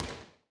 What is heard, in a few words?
Ball Bounce From Near Ground Regular Court